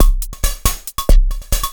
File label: percussion loops, Techno, 138